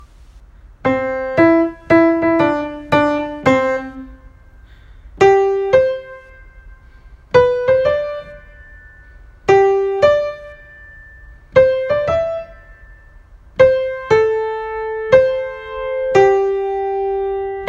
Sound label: Instrumental; kids music library